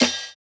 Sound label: Snare, jungle breaks drum sounds